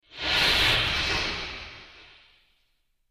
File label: Distant Steam Releas